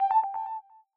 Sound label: notif